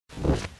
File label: wing flap big; owlboy; otus